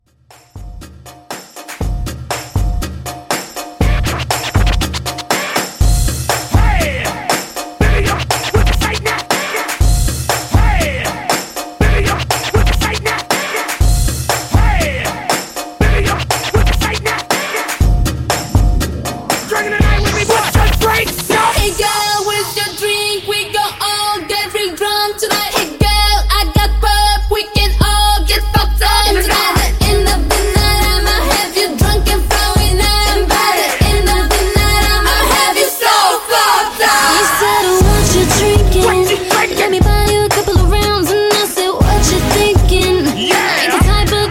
Party Intro 120Bpm

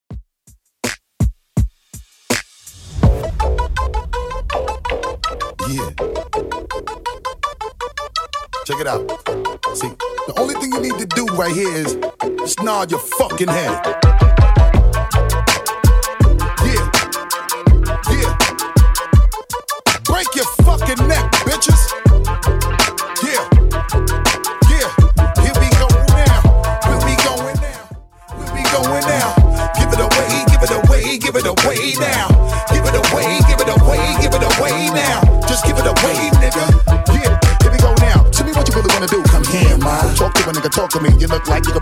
Throwback Rnb 82bpm

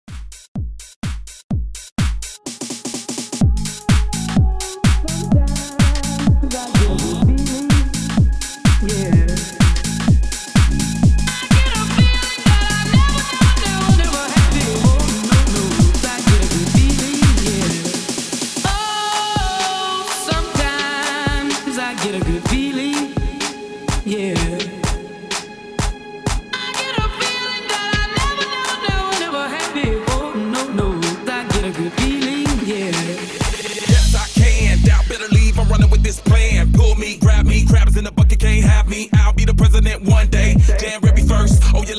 AFRO HOUSE 126 BPM

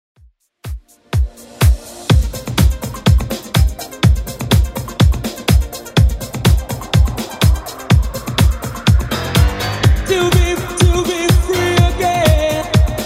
90s Redrum 124bpm

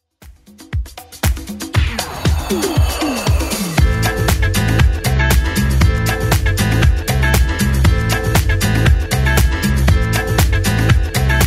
Dance Redrum 118bpm

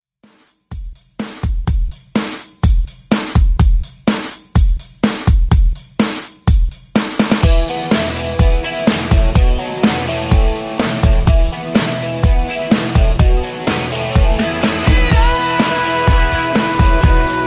80s Rock; 80s Classic Rock Ver.1; 125bpm; remixplanet

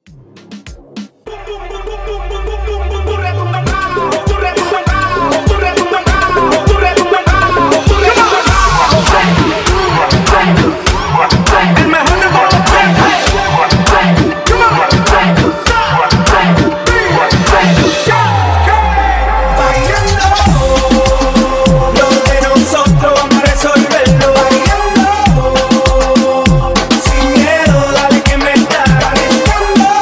Reggaeton Intro Outro Break 100 BPM

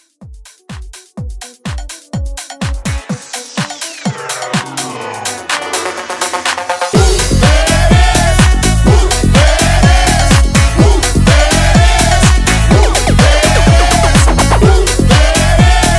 House Mixshow 125

